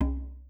reel Stop; netent

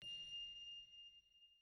reel bounce; netent

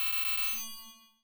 Health Meter Health Blink; netent

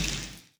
reel Bounce; netent

